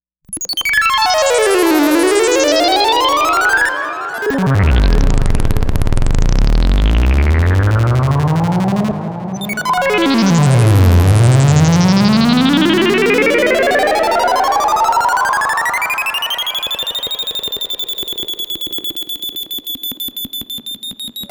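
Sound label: MOOG MAVIS with Stereo Echo Reverb; Rainbow Weaver; Soundtoys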